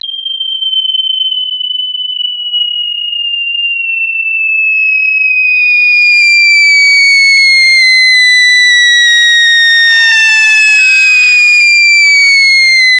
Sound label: ultra realistic nagasaki, nuclear